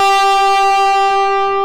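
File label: G3 distortion guitar; AKAI S-Series CD-ROM Sound Library VOL-8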